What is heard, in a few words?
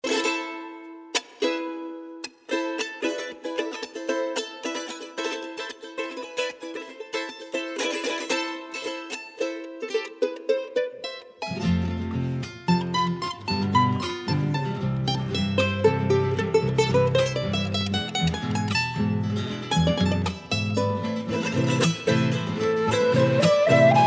charango solo cueca